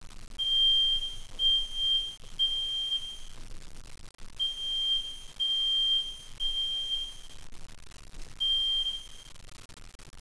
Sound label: Fire alarm